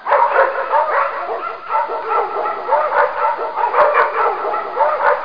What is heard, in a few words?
Dog Pound; The Amazing Spectacular Ordinary Bottle